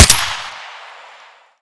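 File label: m4 silenced; m4a1; weapons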